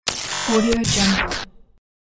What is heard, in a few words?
glitch sfx